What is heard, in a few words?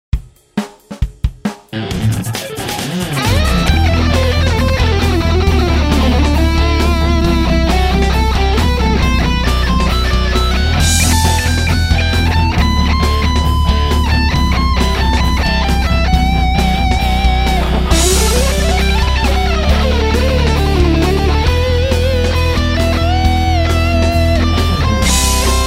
Epiphone